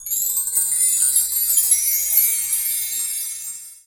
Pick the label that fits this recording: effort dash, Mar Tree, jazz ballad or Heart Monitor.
Mar Tree